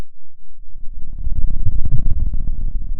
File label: Sound of a dinosaur